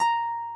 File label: harp